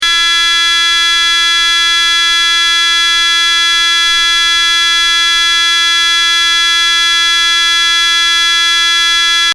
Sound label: buzzer; Transducers USA